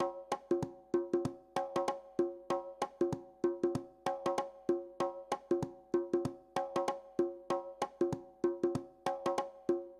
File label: TOPS, viral hiphop